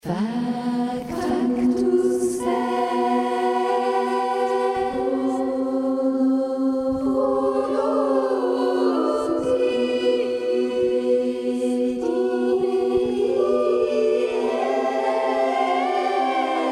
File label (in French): tutti; passionne christus